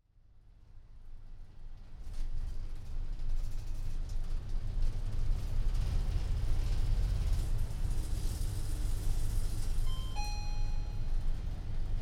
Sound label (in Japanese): 小さい花火; 夜の小道